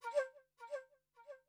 flute, ethno, 160, C

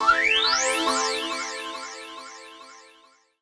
KEY SHOT TEKNO 140 A; Techno2; Singleshots

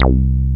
TB BASS; TB-303

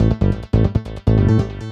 SNTH BASS ELCTR 140 A, Techno

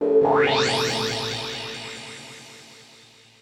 KEY SHOT TEKNO 140 A; Techno2; Singleshots

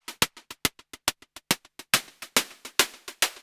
HIHAT TECHNO 140, Techno Drum; Techno2

